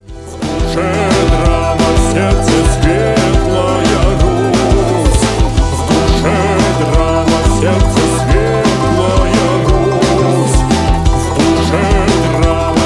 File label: acoustic